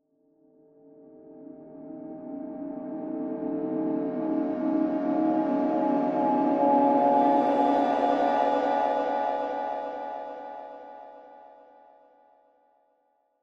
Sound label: Horror Cymbal Swell Dark; Scary Tones; Blastwave; Sound Effects:Horror